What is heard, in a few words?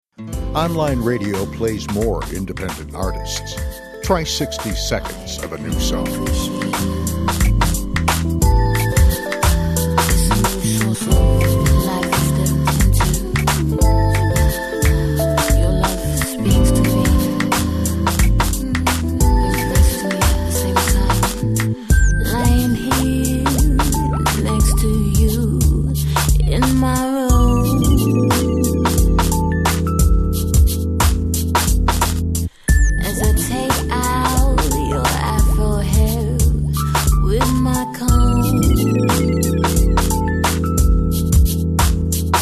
soul